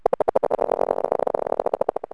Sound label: ALIEN, creepy sounds